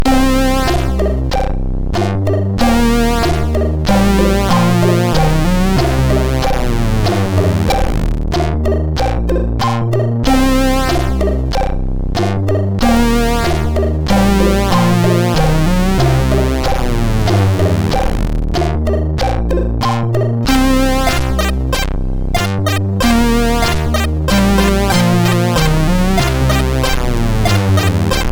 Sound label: Old Music, MOS6581R2